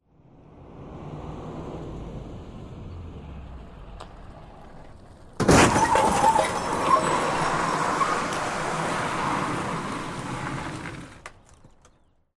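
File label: car ran over something and keeps moving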